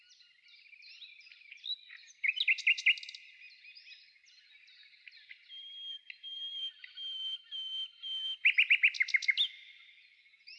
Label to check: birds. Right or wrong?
Right